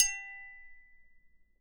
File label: Spoon, Household, IR Samples; Convolution; NI Kontakt 5